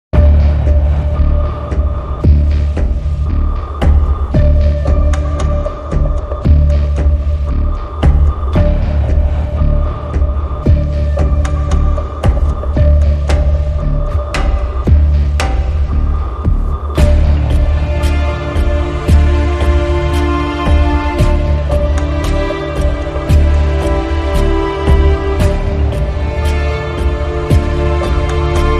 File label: No Piano No Guitars